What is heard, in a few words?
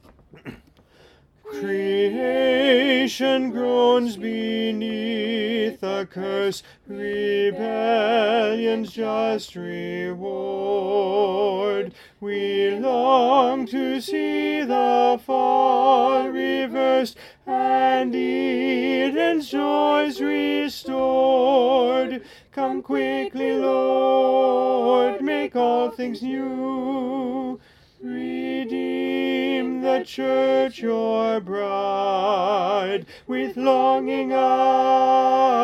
Tenor